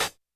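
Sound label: Hi-Hat Pedal Break; YAMAHA RM1X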